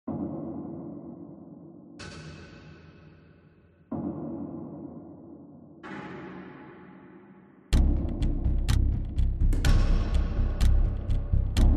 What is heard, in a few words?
Horror Movie